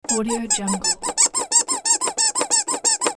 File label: baby toy squeeze; hollywoodedge